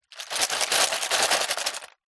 foley shells in plastic container movement; zapsplat

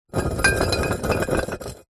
bottles x2 beer full movement scrape on concrete; foley; zapsplat

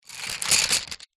office pencils x30 pick up from carpet

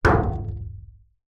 impacts metal knock water tank

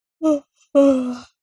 human boy aged 9 yawn

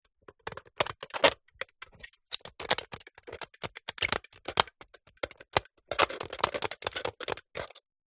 soda can empty cut with serrated knife; foley

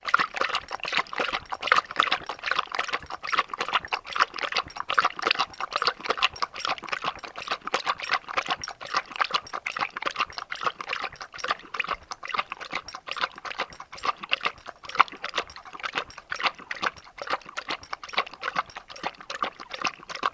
animals dog labrador drinking; sound effects one

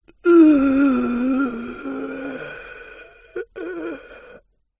human breath last dying

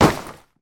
foley bag holdal polyester foot kick; zapsplat

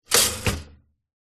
household toaster modern press down

